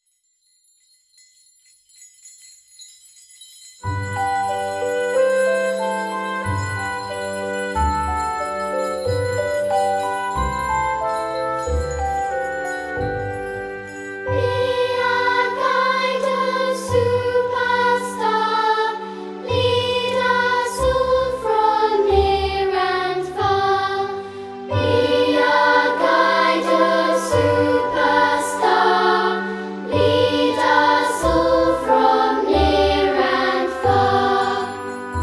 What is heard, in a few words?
Star Chorus